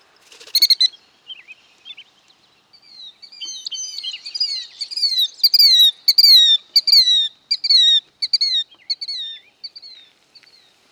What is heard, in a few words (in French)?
Pluvier kildir Charadrius vociferus, chants des oiseaux